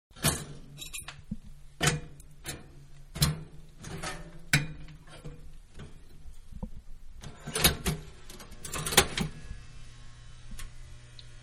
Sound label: Toaster Down And Cook, Four Slice; Food; Household; Manhattan Production Music